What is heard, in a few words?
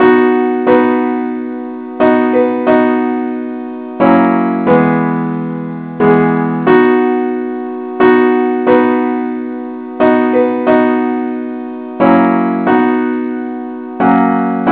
simple acc key b